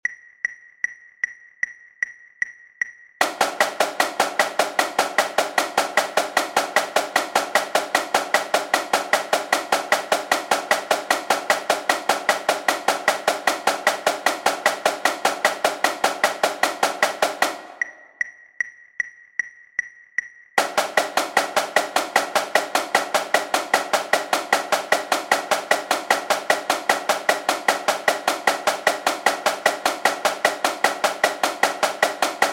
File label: snare